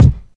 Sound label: sharp kick c key